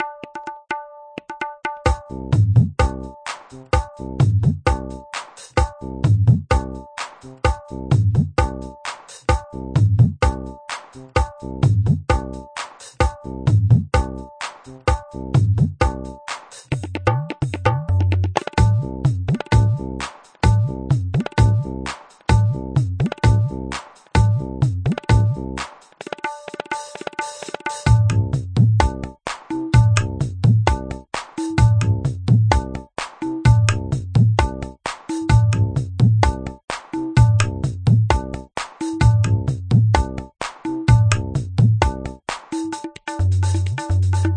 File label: FILMI MIX, grooves